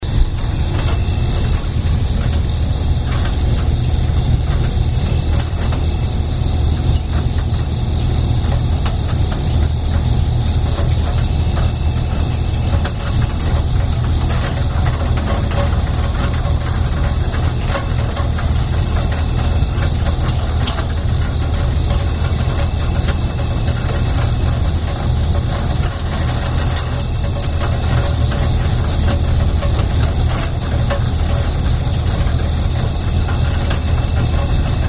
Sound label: porch rain; Bloomington